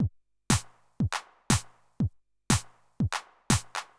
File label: House Drum NoHH